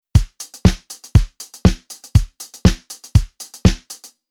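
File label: Rhythm Disco pattern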